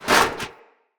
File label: Battle Dog Helmet Destroy Chunk; Snc Battle Dog; Foley